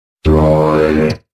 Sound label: Droid Amp